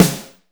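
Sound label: ALIVE snare, drums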